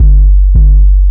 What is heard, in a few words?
Sub - 6A, Jungle; Bass; Blu Mar Ten; Jungle - 1989 to 1999